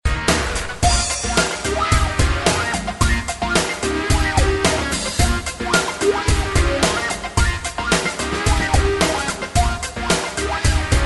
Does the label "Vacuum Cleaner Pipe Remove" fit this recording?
No